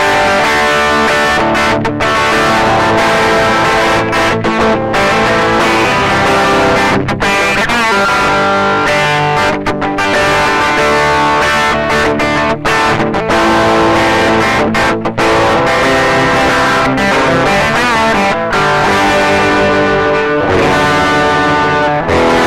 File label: classic hard rock, Distortion